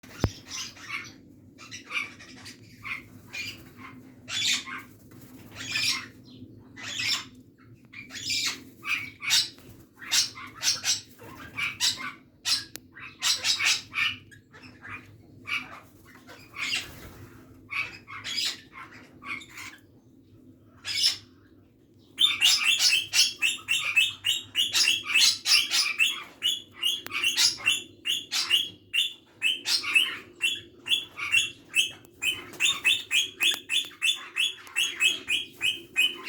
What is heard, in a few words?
barnardius zonarius, parrots